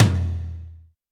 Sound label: high floor tome drum sample f key; tom drum samples